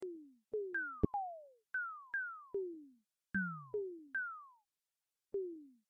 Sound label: synth; monopol